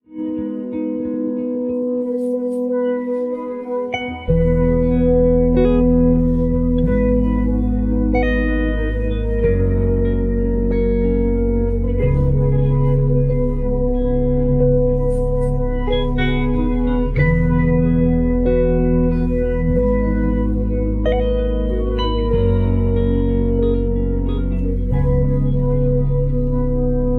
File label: instrumental; demixificateur